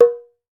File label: CLAY BONG, African Perc; E-MU Formula 4000 Series Vol. 4; Earth Tones